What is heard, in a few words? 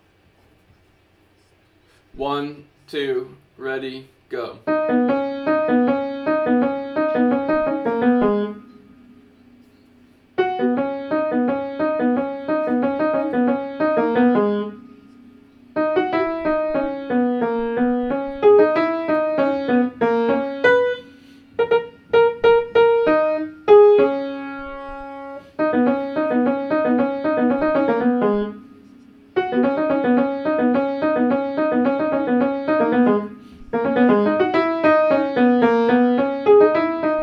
alto melody